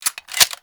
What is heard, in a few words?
Clip In, Weapons; Furis